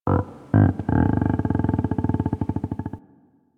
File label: Landscape Mushroom Creak, Ambience